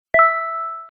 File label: text receive